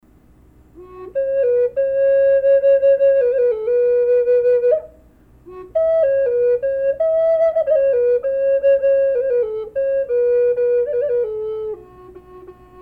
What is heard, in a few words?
ambrosia maple, flute; howling wolf; collector flutes